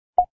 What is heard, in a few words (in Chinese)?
按鈕 左右 ui